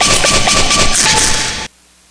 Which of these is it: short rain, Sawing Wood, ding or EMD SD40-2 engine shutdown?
ding